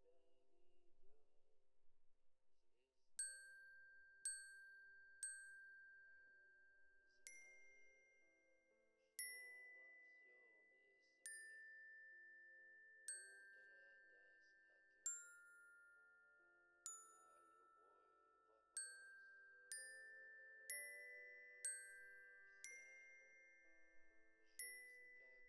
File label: Glock Close